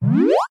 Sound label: cartoon ascend rise short slide; zapsplat